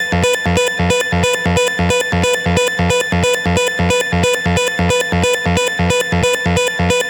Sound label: Synth Loop A 135, Techno; Ghosthack; AC2024 - Day 1 - Techno Sounds 2025